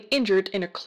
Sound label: Hurt; Phasmophobia